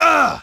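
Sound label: m oof, male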